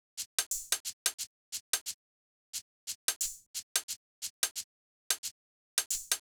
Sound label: Hat, 089 Bmin, Drum Tracks; Hip Hop; big fish audio; Hip Hop Exotica; Apple Loops